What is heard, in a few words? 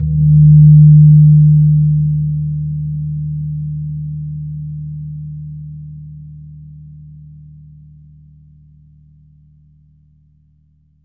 GONG D2; USB Soundscan vol.60; Asian Traditions; AKAI